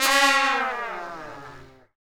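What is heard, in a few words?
BRS, Brass Trumpets FX, Trumpets Falls; Tps Falls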